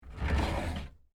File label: Drawer Open Hotel, Foley, Drawer, Open, Hotel, Dresser; Drawers; Sound Effects:Household